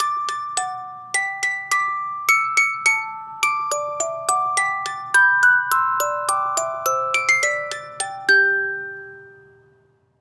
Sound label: Music Box; Assassin's Creed Syndicate